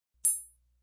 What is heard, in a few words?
Ting, Metallic; Electronic